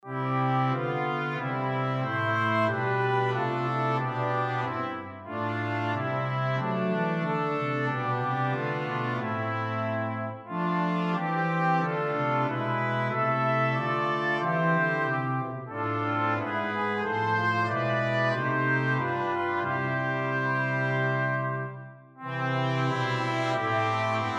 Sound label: Brass Quintet; Liedboek